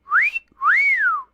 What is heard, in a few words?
Whistle Human; SFX Kit; Foley - Misc